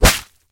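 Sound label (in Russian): яростный удар по лицу ладонью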